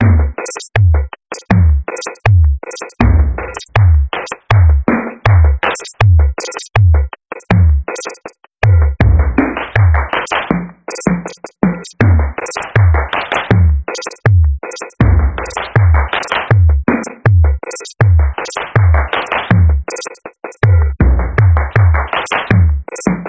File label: 80 Bpm Attack Loop